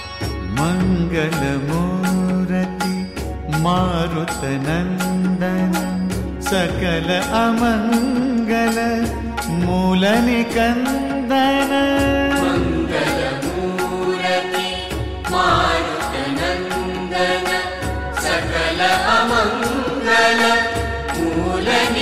Bhajan Song Ringtone

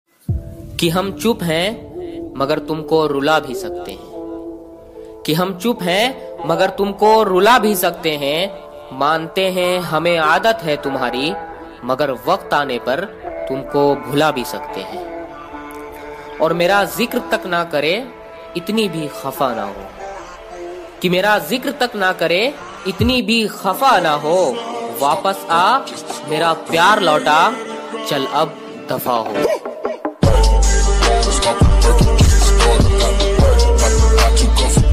Boys Attitude Ringtone

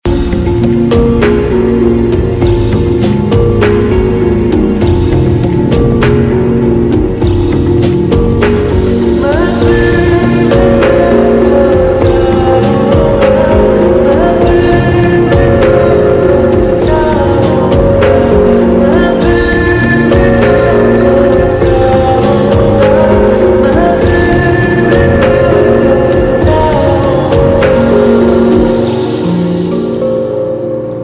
Bollywood Slow Music Ringtone

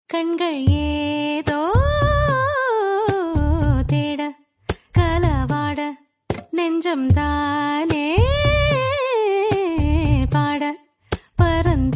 Tamil Love Song Ringtone

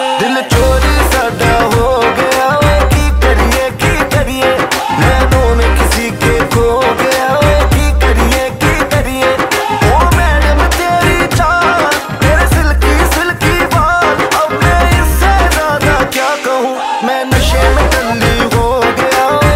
Ringtone

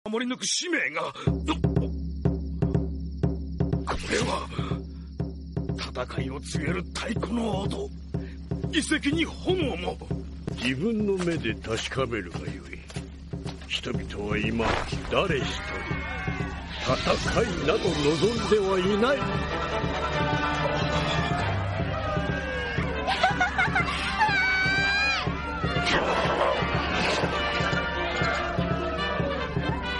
Ringtone; One Piece

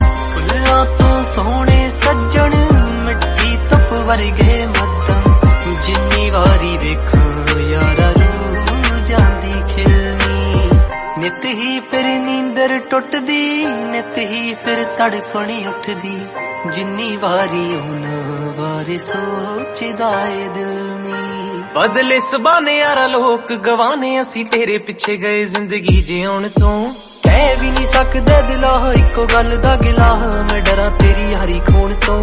Love Song Ringtone

